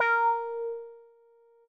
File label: A#5 meow